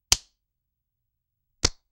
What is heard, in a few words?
slap in the face